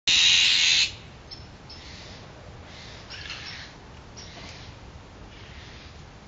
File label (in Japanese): kogera